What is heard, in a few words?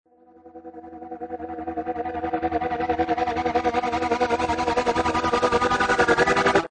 Dark Tech Gtr; Loopology; Pumpin; Techno & Dance